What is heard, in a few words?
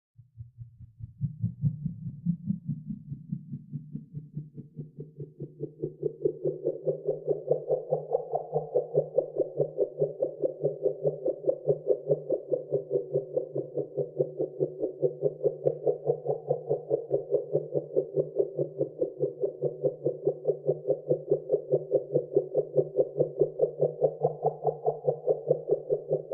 ALIEN SAUCER, spaceship